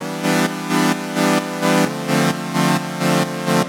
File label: Gated Synthline (130BPM); musicradar